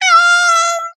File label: cat meowing